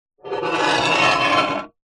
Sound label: sword slow scrape on metal or other sword